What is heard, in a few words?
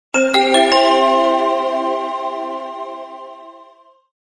bell